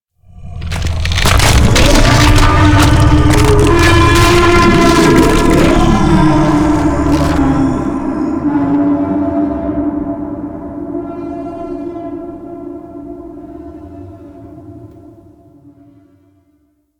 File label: Regrowth Orb Chatter; Props; Warframe; Ambience; Sentient Devourer